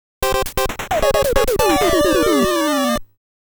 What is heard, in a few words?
Reggae Card, Unlucky; Rockman Sound Box 2